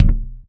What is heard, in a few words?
glass, footsteps; player; Dystopia